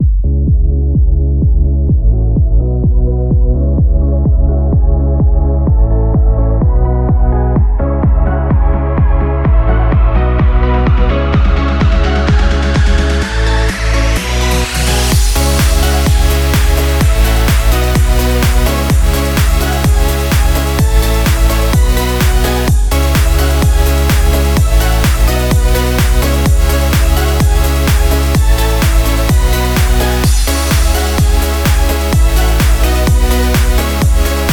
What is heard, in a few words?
positive upbeat dance pop